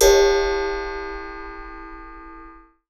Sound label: Raw, Wire & Tin, contact mic